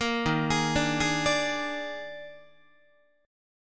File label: Eb Major 7th Suspended 4th Eb, Guitar; EbM7sus4; arpeggiated